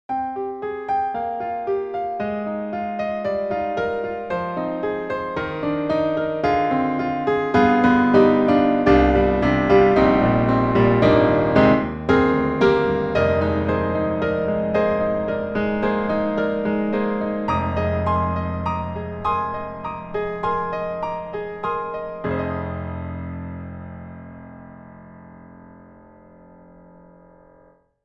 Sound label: Grand Pianos, Bosendorfer, Imperial Hall Grand; Synthogy; IvoryII